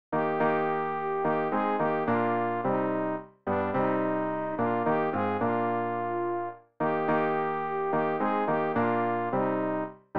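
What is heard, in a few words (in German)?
sopran, Übehilfen; Ref. Gesangbuch; RG 071: Laudate omnes gentes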